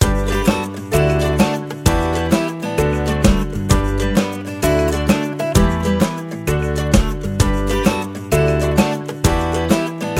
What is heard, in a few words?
Fun Ukulele Music; MIUI12